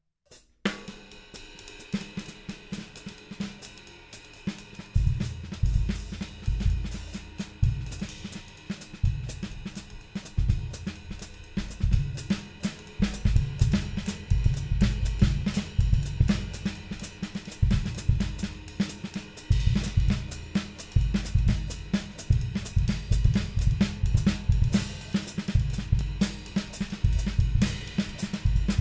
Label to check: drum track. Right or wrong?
Right